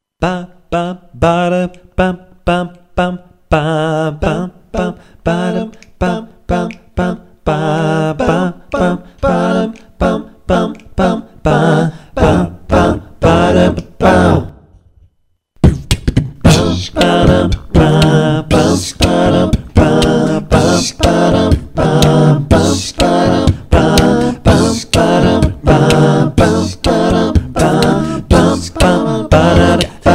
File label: acapella groove